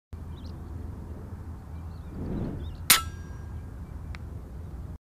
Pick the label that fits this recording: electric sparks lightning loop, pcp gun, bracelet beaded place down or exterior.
pcp gun